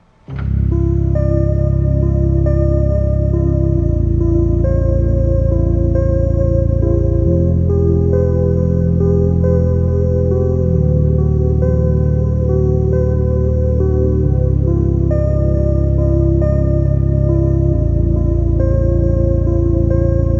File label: Slowed + Reverb